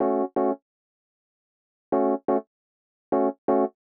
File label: 125 Rhodes A, Keys, Nu Jazz House; PowerFX - Nu Jazz House